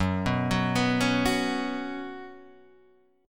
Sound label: Gb Suspended 4th Gb, down, Guitar, Half Step Down